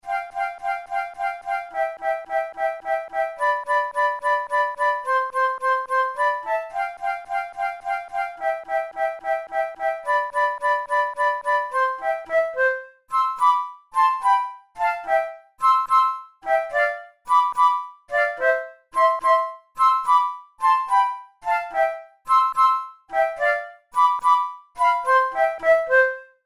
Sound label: waltz, flute